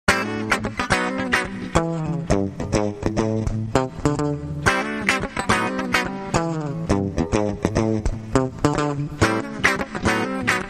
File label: Lugdunum Funk